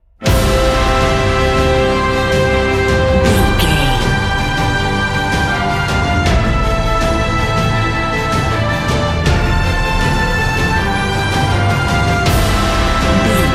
cinematic corporate

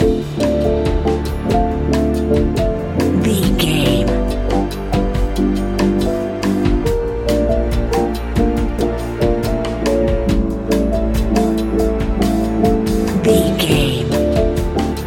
tropical luxury

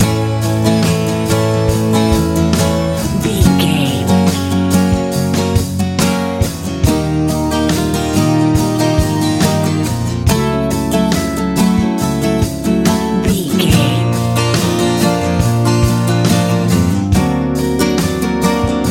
lite pop ballad

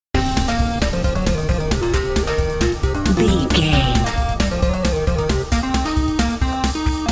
epic video game mission loop

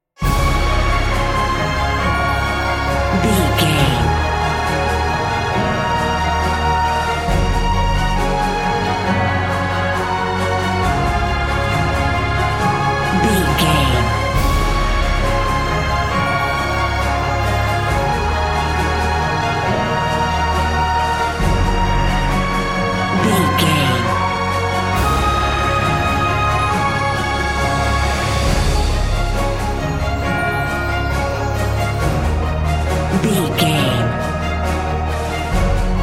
epic emotional

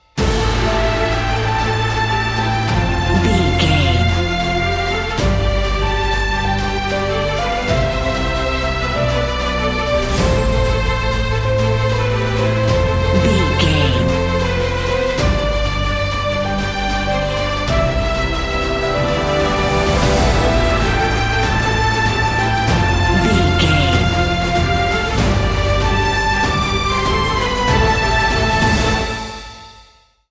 epic cinematic

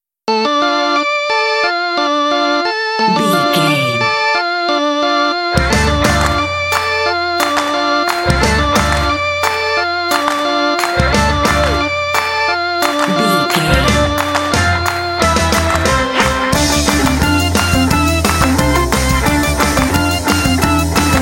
rockers

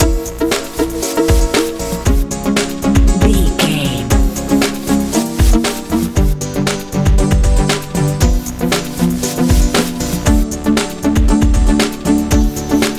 colorful nice wind sprint love modern dance

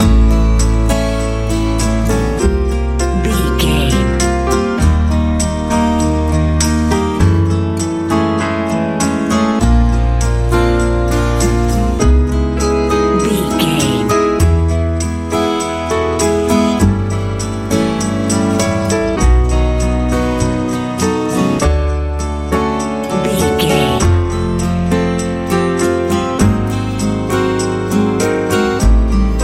country pop ballad

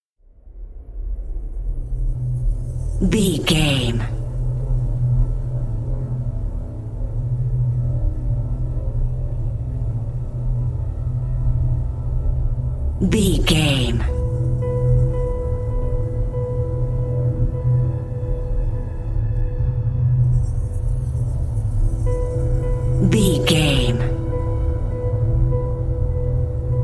dark desolation narrative

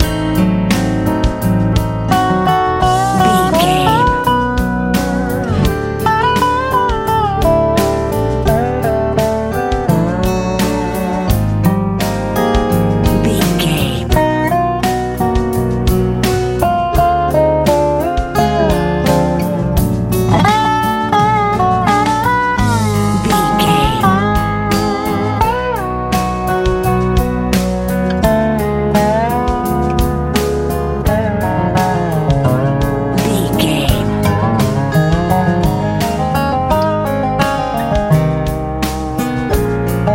easy country

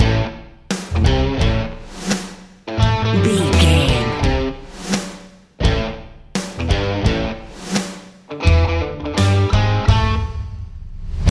dad rock loop

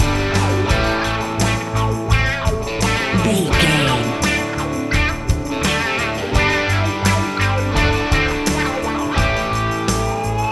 dad rock

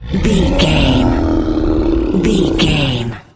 large monster roars

